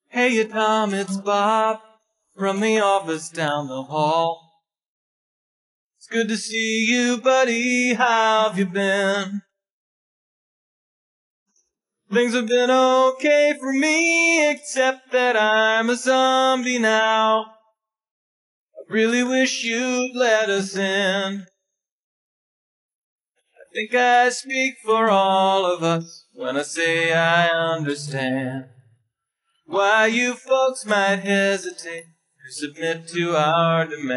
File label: vocals; spleeter